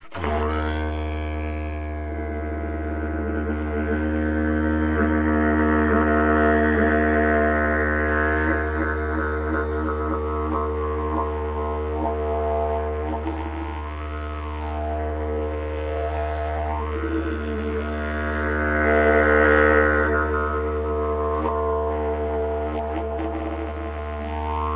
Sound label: vocal, didjeridu